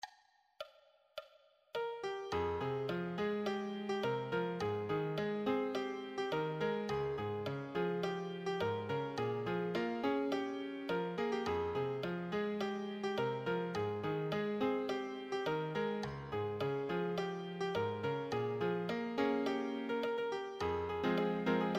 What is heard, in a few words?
solo, piano